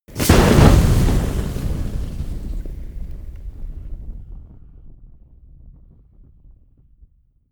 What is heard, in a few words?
campfire large inflame